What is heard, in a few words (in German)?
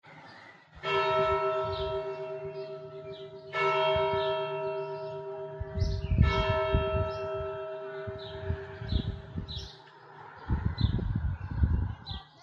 Glocken